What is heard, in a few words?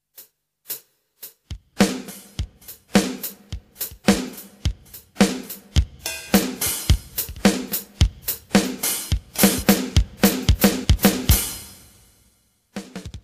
drums